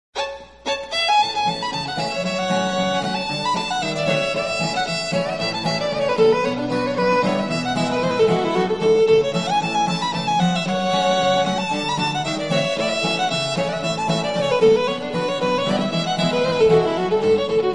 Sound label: Fiddle